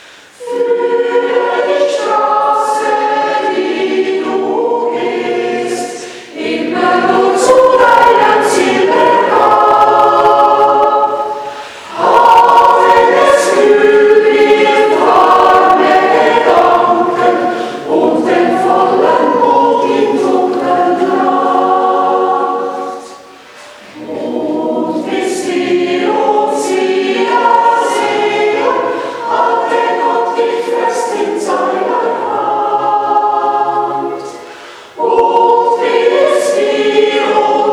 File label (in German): Irisches Segenslied